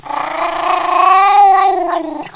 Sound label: cat alert